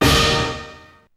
XE8 hit; Hollywood Edge; The Big Bad Beatmachine

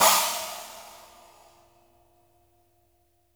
DING, cymbals; Sampleheads - New York City Drumworks VOL-1; SW CYMBALS

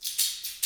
metal jangle, perc fx; Roland LCDP14 Africa VOL-2

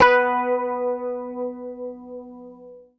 B3, Bass Library, PICK; Sampleheads; Will Lee Bass Library

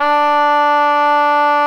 D 3, richter; SoundBytes - Early Patches

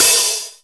HH, Korg Electribe EMX-1

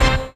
RAVE, RAVESYN

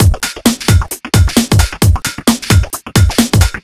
132 Full, Drum Loops; Zero-G; Soundclash Nu Dance Electro; Acidized Wavs; 132bpm

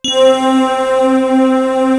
GENTLE, KORG; WS KORG#1

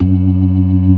ORGAN, C3 NO PERC; Keith Emerson Signature Series

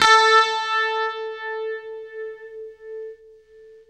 UNIVIB A 3, Guitar; East West; Steve Stevens Guitar Samples Collection

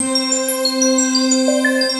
ATMO SYNTH

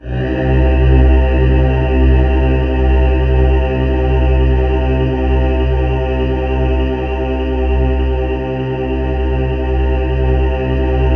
C2, Synth Leads

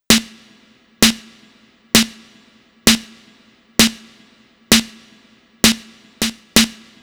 Snare Loop; Zero-G; Soundclash Nu Dance Electro; Construction Kits; Feet First

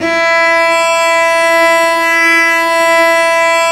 STR VC P, Vc Arco Solo, Arco p nv

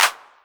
808 Clap; Novation Drumstation

